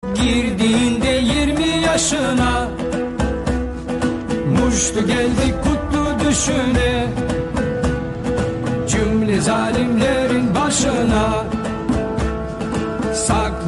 arabic song

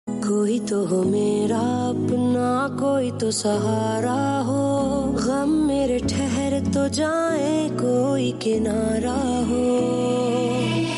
pakistani ost song; humsafar

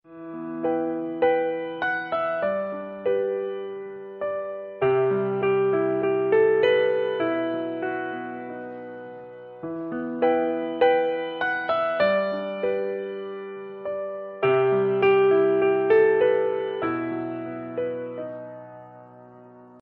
sad piano

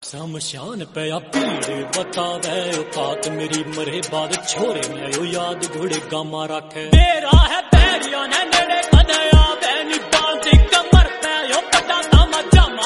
drill attitude haryanvi

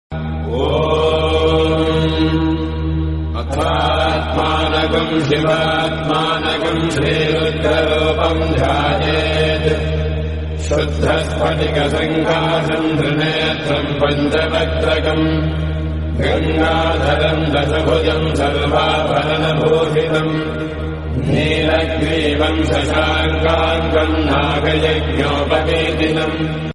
om namashivaya, lord shiva mantra, devotional

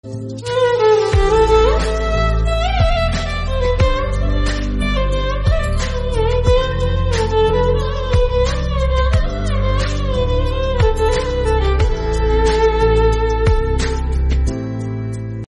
instrumental theme

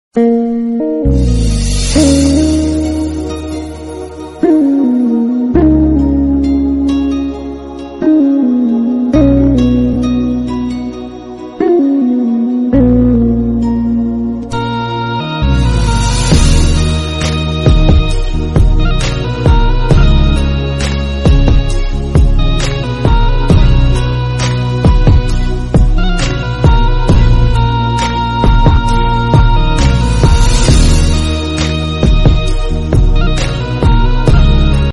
sad bgm turkish

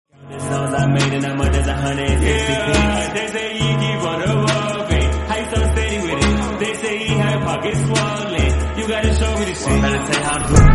slowed english instrumental

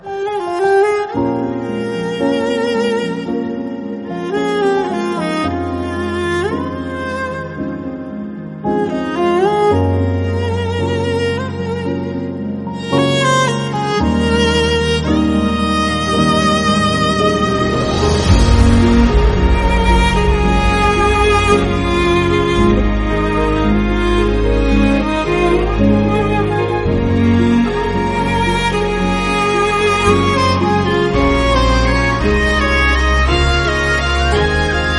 emotional bgm background music; vikram

